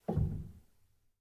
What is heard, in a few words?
rel, Grand Piano; bitKlavier Grand; Piano Bar